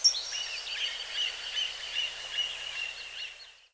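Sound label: distant birds, forest; ambient; Half Life 2; Episode 2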